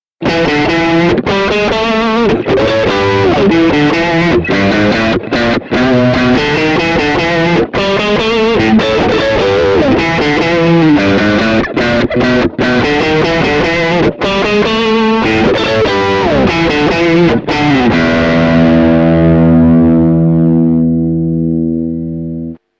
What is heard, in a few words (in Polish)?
Fen Przester SG, classic strat single